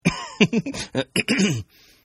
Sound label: Giggle Male; Laughter; Partners In Rhyme